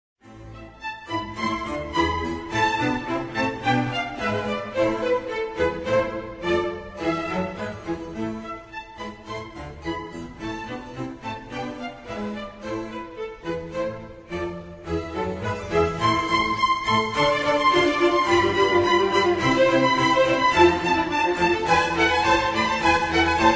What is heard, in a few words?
violin concerto in a minor presto; vivaldi; rv 356; 3rd movement